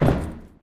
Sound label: Step; MTF